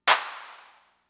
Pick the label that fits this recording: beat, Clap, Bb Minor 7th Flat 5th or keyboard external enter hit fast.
Clap